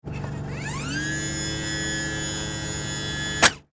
Emergency Stretcher Lower Wheels Automatic Ambulance; Emergency Vehicle